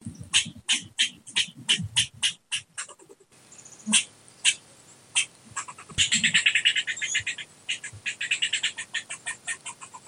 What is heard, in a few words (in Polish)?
wiewiorka; Dzieciaki Ratuja Zwierzaki